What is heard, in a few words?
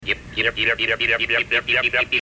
yip yip